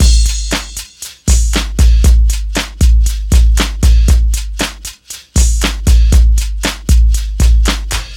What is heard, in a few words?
117 bpm fresh hip hop drum groove A key; drum loop